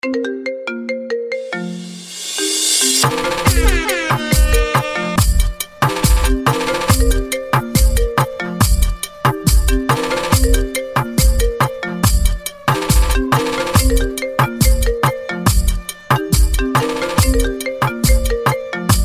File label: iPhone 13 Reggaeton